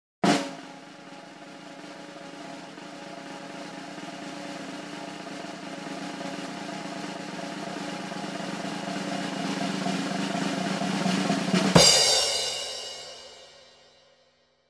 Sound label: Snare roll sound effect with crash end hit